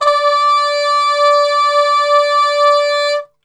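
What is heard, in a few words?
D 3 trumpet cup mute; Best Service; Brass Super Section; TRP CUP MUTE; 90 s Sample CDs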